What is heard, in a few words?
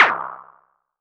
snare fmlofisnare; Polyend; Recue